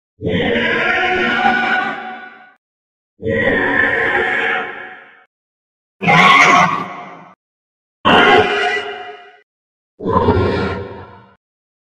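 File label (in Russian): полтергейст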